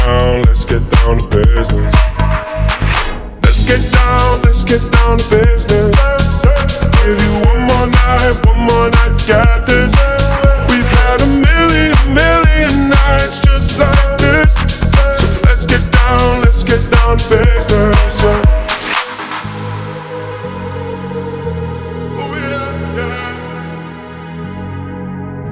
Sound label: Business Ringtone; Best English Business